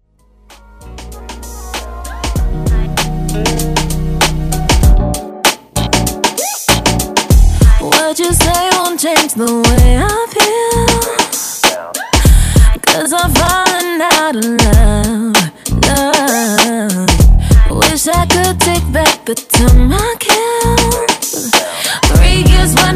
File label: RNB